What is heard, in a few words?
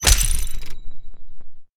atk ice prick